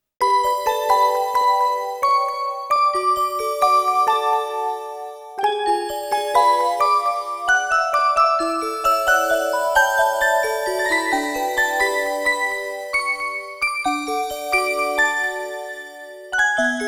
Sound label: Let's Join Hands! ~Music Box~; Kid BGM Collection Vol.1; Tentama 2wins